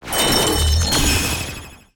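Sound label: Disappear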